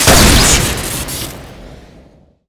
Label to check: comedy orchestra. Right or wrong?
Wrong